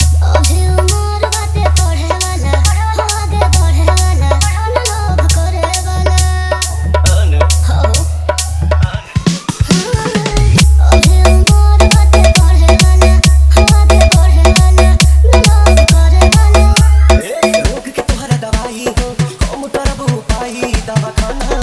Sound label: Bhojpuri Dj Remix Songs Edm Trance Mix